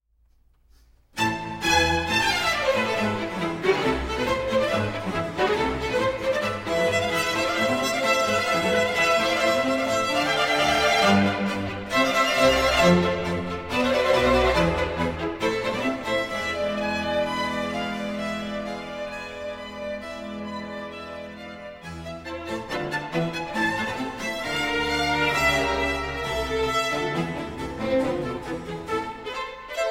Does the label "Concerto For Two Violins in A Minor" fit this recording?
Yes